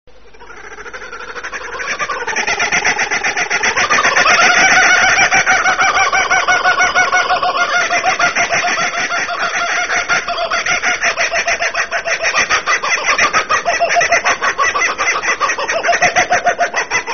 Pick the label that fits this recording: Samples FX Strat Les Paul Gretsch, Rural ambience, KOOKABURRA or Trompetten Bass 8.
KOOKABURRA